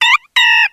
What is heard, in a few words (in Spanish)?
Grito de Pancham